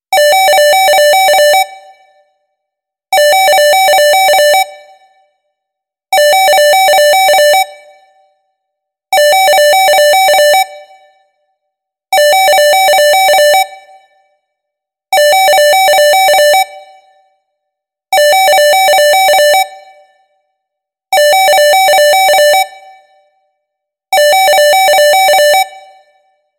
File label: Home phone